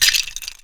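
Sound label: debris small; LEGO Star Wars Force Awakens; Junkbot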